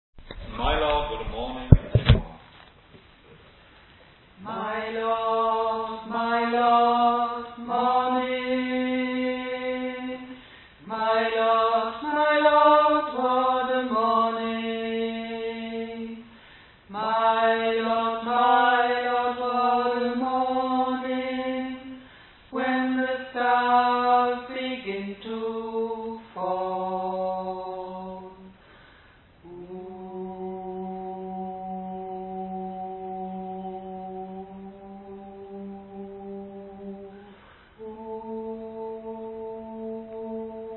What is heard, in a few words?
Tenor; My Lord What A Morning